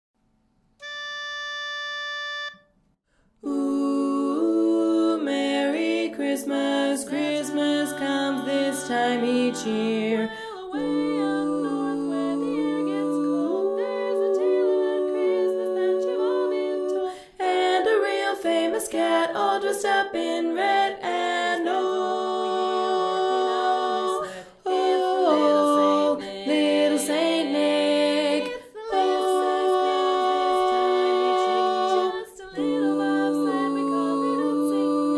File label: bari